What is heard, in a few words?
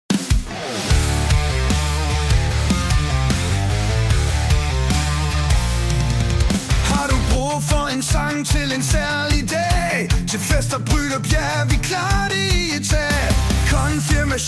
Rock Version mono; MelodiLab